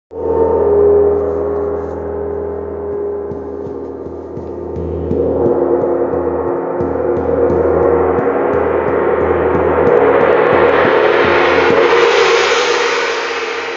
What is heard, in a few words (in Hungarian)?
Windgong 70c, wind gong lapgong 70 cm atmerovel; gongok